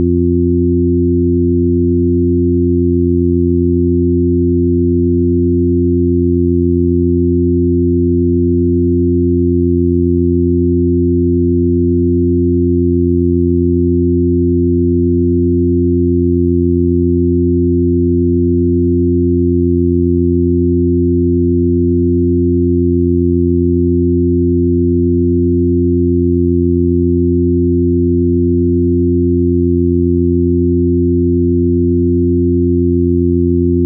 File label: F Reference Tone